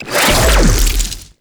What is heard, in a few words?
Beam Shot; Warframe; Weapons; Archwing; Larkspur Prime